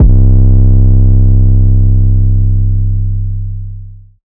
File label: 808, trap drum kit; Fire; MZ BEATS - FREE TRAP DRUM KIT